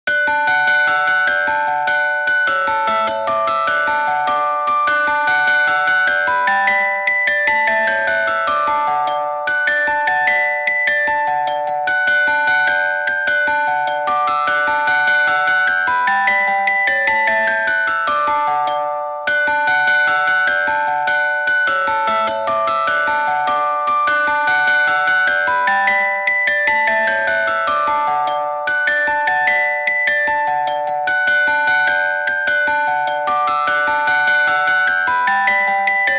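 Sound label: Ice Cream Truck, Bear Went Over the Mountain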